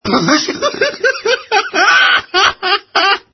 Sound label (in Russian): Смех